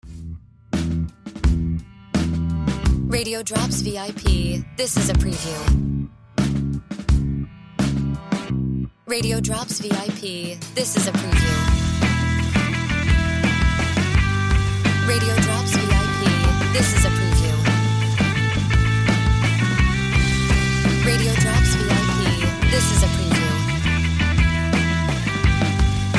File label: Music Beds